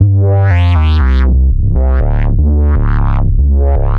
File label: Tech Bass C0, techno 120bpm; musicradar; Bassline